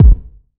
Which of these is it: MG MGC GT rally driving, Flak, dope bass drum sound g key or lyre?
dope bass drum sound g key